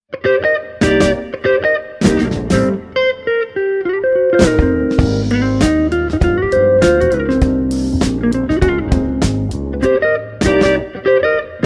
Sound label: jazz